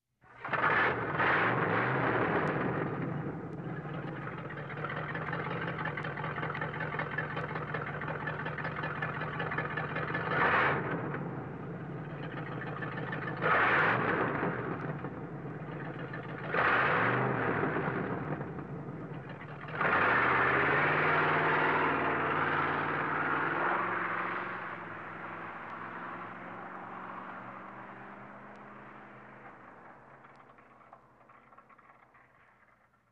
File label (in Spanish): coche muy antiguo